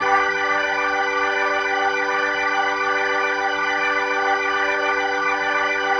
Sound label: Progression, Organ, Loops